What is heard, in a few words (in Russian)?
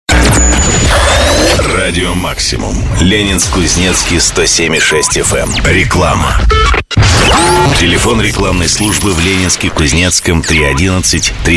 Радио оформление; Радио Максимум